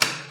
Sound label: switch, elevator parts